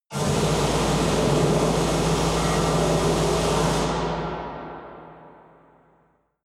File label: air flamethrower